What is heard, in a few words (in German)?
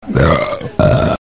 ruelpsen